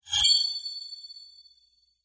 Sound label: round score tie up